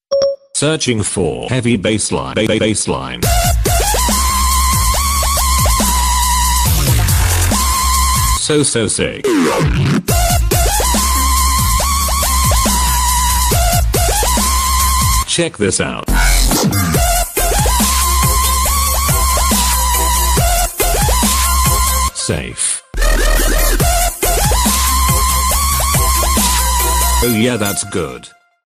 siri ringtone

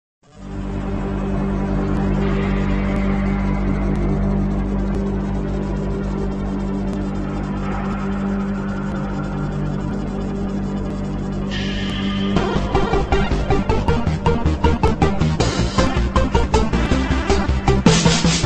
electro gypsy ringtone

